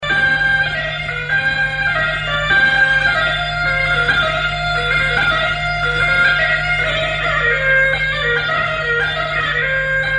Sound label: touloum